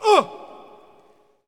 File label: Hockey Male Hurt; SFX Kit; Hockey Vocal Sounds